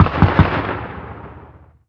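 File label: gun 50cal distant; Call of Duty Modern Warfare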